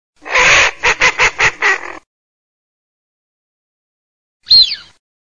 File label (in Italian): fischione, birdsound